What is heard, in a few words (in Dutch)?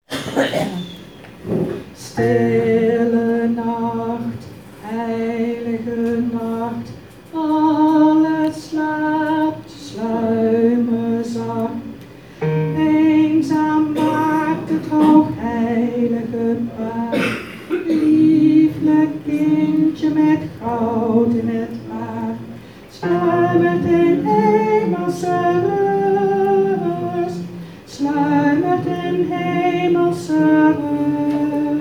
Stille nacht Bas